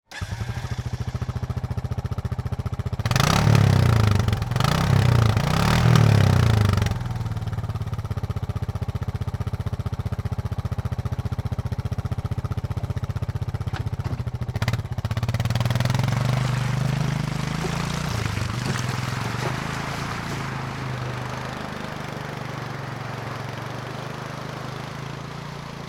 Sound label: transportation atv with trailer start up rev drive away very muddy wet; sound effects one